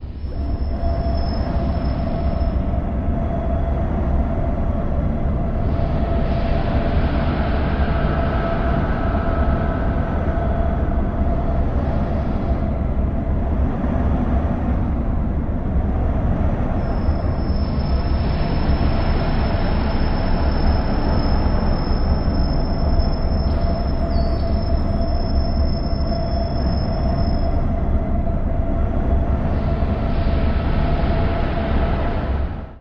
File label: Radio Tuning Distorted, evolving